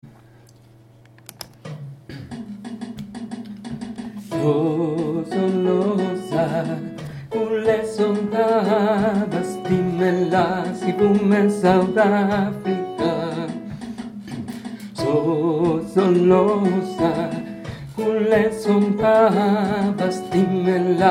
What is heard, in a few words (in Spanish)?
Sopranos; shosholoza